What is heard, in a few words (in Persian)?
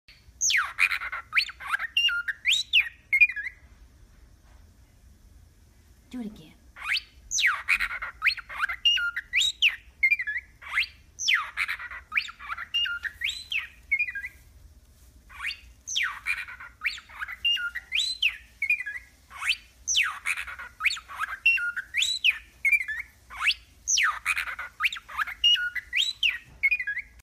تقلید صدای ربات توسط عروس هلندی; R2D2; جنگ ستارگان